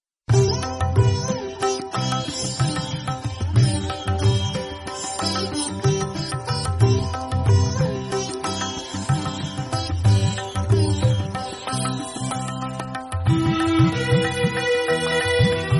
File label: instrumental; dabang